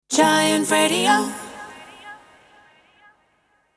Sung Jingle Radio 124bpm Abmaj Acapella; Giant Radio

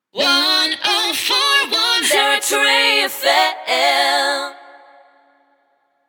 Jingle Sting Acapella; Territory FM

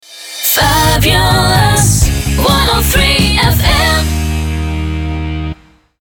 Jingle Sting 128BPM

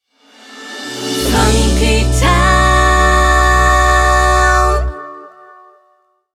Jingle Sting 102bpm

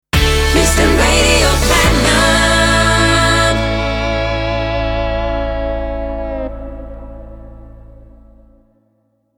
Radio Jingle A Key 141bpm Sting; Houston Radio Platinum

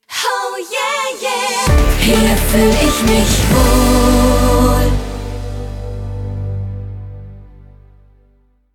Schlager Jingle Sting

